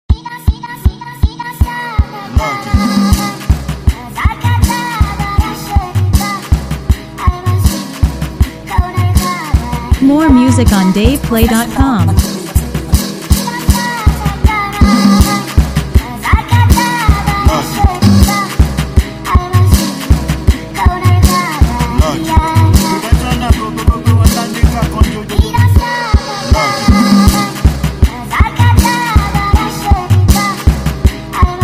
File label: Professional Beat